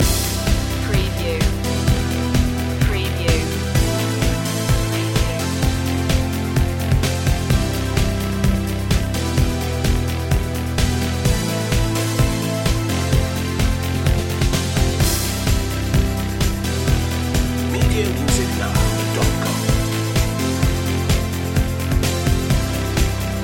lexicon loop

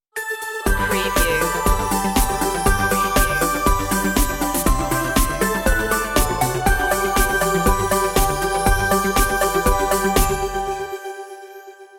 merry christmas synthified stinger outro

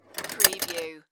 Pinball sound, roll past metal gate or lever

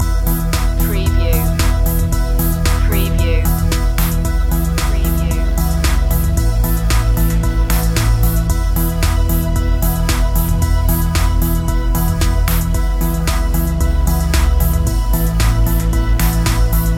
modular motion loop

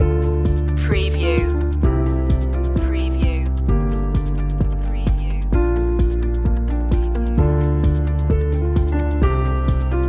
asian corporate loop

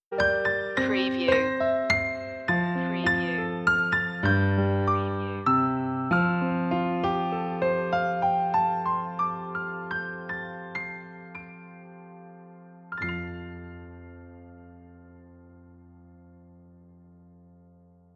away in a manger piano; media music now

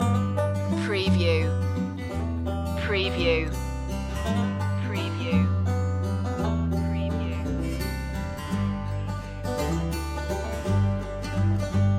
waltz loop

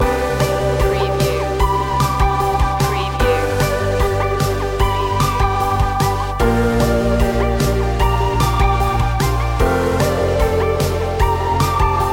a clear mind loop; Corporate / Motivational

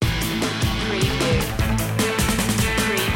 run loop b

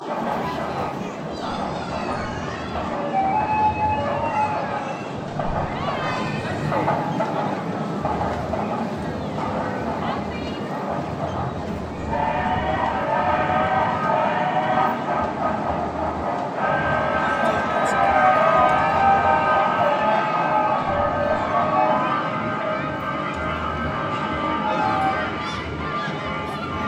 Arcade, fairground, promenade ambience; Game Sounds / Amusement Park / Arcades; beanstalk audio

